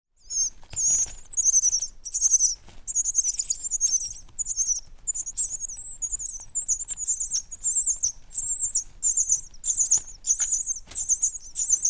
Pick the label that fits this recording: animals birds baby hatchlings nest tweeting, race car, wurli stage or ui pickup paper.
animals birds baby hatchlings nest tweeting